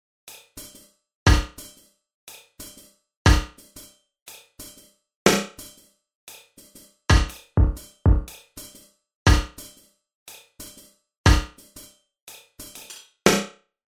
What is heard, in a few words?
CHORUSED ROOM 30MS